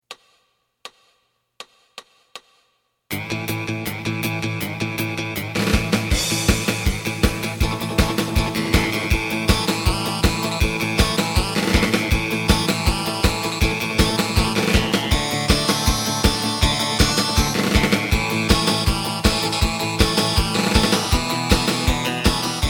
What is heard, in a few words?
bass